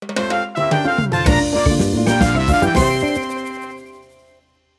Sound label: Jingle Lesson Start; Disney Art Academy